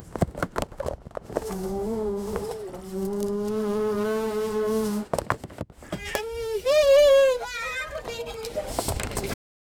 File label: balloons rubbing against